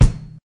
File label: original kick single shot d key